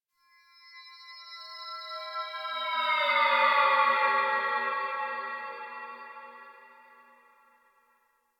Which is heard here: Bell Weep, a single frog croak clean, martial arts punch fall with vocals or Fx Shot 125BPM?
Bell Weep